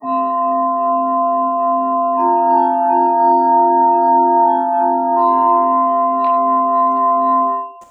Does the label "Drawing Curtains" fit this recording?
No